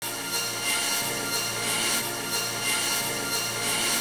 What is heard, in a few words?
groove buildup